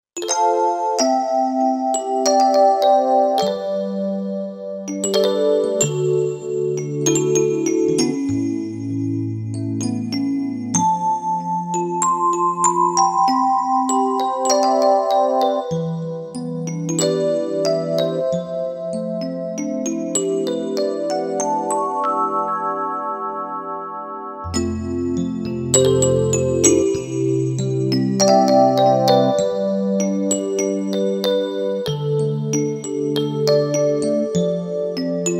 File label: Tine Elec Piano, Kurzweil K2000; Kurzweil K2000 V3